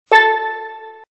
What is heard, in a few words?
Drum